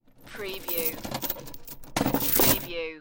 Heavy Chain Rattling Drop Down

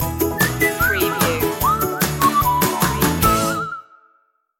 just for funsies stinger

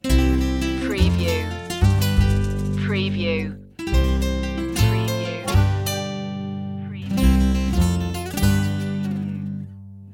cartoon kit

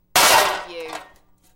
Metal Medium Impact Settle

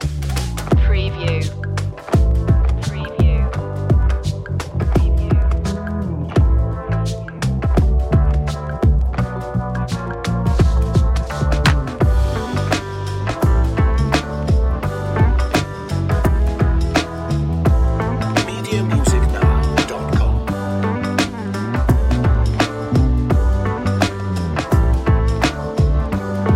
chill jazzy vibe

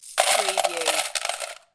Slot Machine Win - Coins Falling Into Payout Slot

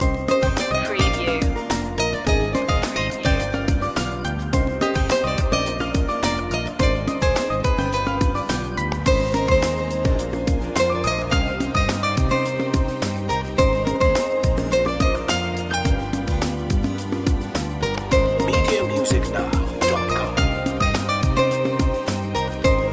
latin nights

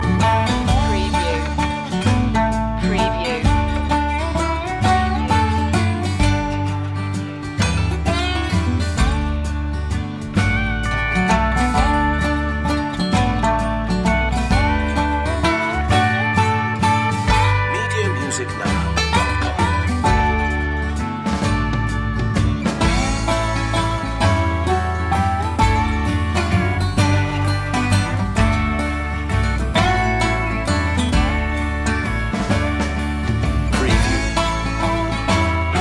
goodnight waltz loop

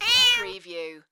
Cat Meow

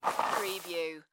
Soccer Ball Roll

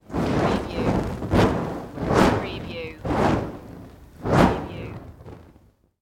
Torch Pass By Whoosh

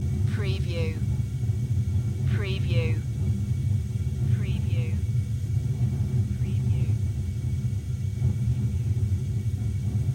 Water Heater Gas Run; Sound Effects Bible

